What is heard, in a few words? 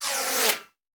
Bullet Pass By; Sound Ideas; Guns